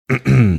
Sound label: throat clearing